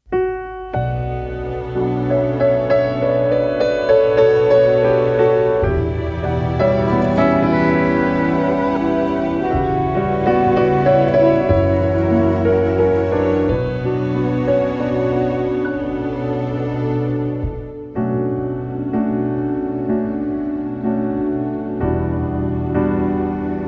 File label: Instrumental